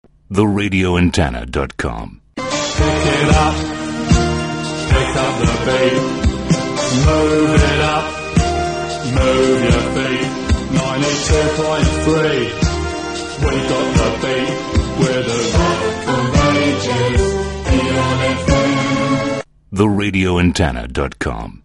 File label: Jingle; Aircheck; EON FM